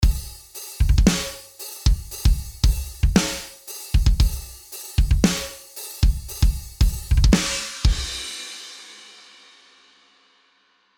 Dry Metal Kit; Addictive Drums 2

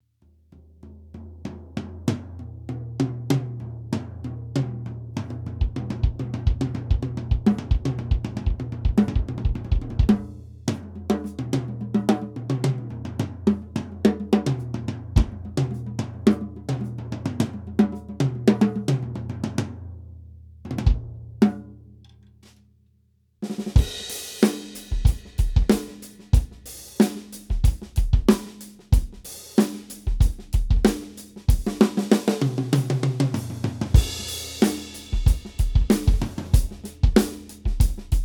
Tama Superstar Classic Neo Mod Low

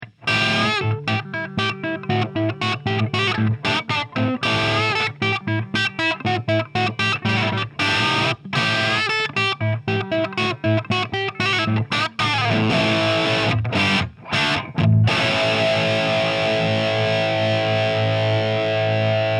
Light Crunch Regular Guitar

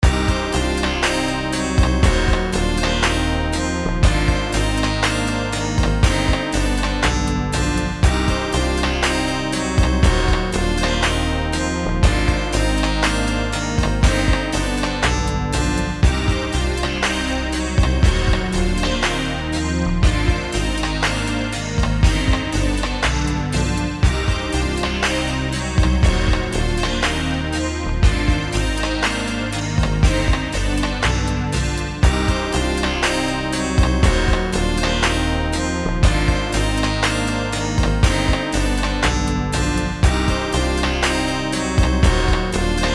Roland RSP-550 Microwave; Microwave1 RevA Vprom

